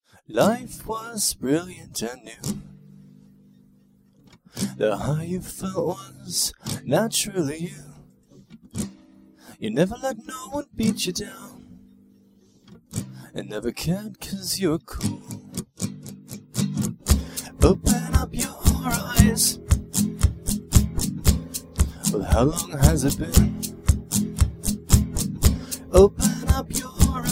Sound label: percussive